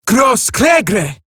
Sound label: surprised, female; en; Eximus Grineer; Battle Barks